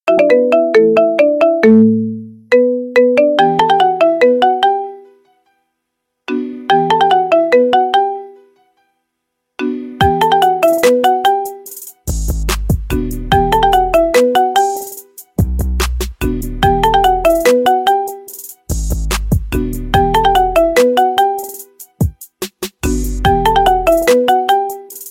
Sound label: marimba remix